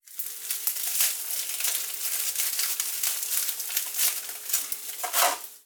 breaking frying; nova sound

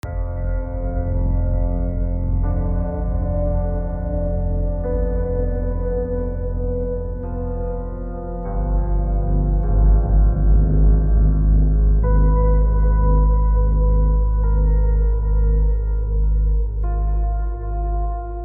atmos

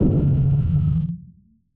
phase lazy bomb filtered explo

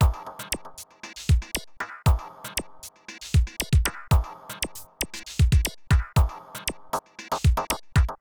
electro

